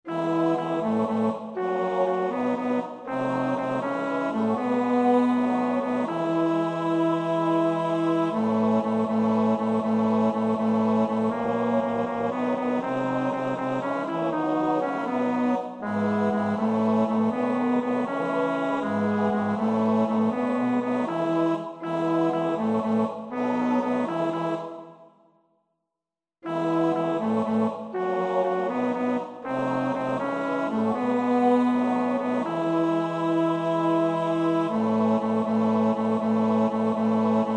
Zum Rundetanz (bas), Männerstimmen; Schubert (Franz); Vier Gesänge für vier Männerstimmen, Op. 17; 3.Zum Rundetanz, Op. 17, No. 3

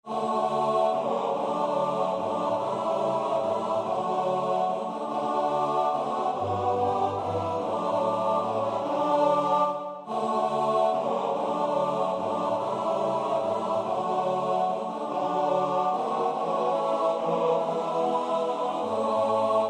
Liebe (T-T-B-B), Männerstimmen; Schubert (Franz); Vier Gesänge für vier Männerstimmen, Op. 17; 2.Liebe, Op. 17, No. 2

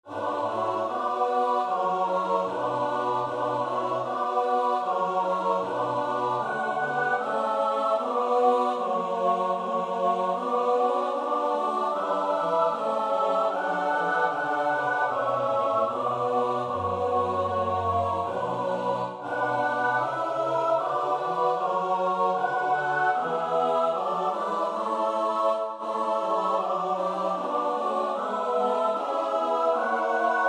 S A T B; Bach (Johann Sebastiaan); 7 Allelujah

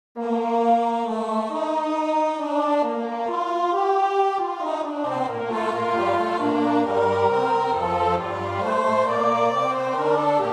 Benedictus (S-A-T-B); Mozart (Wolfgang Amadeus); Requiem K 626; vanaf maat 54

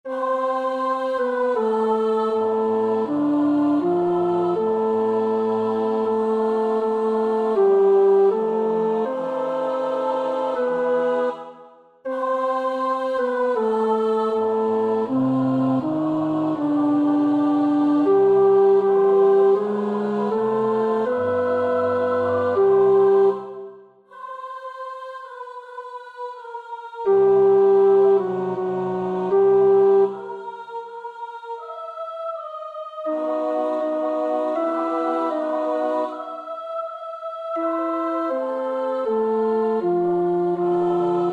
tenor; Hensel (Fanny); Gartenlieder

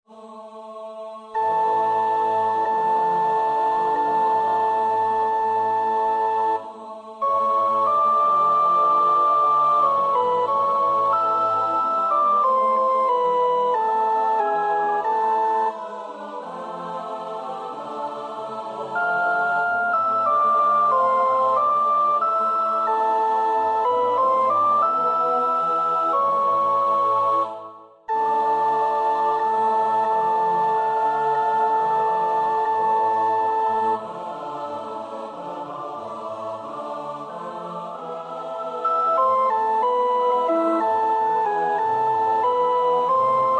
Hosanna to the Son of David, sopraan; Weelkes (Thomas)

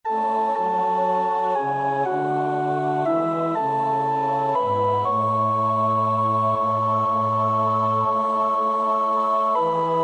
O Freud, o Lust, o Leben (sopraan); Wolf (Ernst Wilhelm); Jesu deime Passion

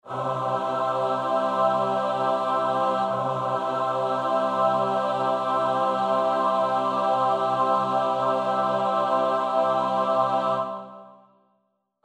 Come, let us Worship (S-A-T-B); Rachmaninoff (Serei); Vespers Op.37

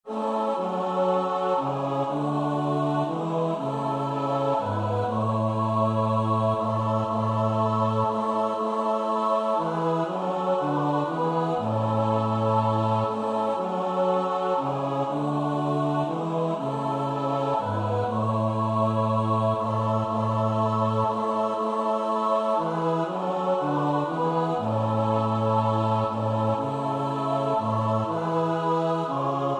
O Freud, o Lust, o Leben (S-A-T-B); Wolf (Ernst Wilhelm); Jesu deime Passion

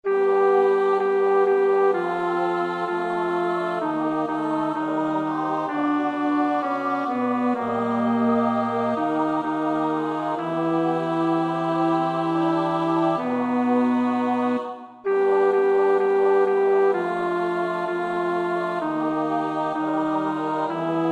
Ave Maria, bas; Witt (Robert William); Four Motets to the Blessed Virgin Mary, opus 29; II. Ave Maria

